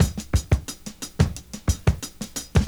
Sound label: OLD SCHOOL, Breakbeats